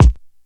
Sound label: mid heavy steel kick drum a sharp key, kick drum one shot tuned to the A# note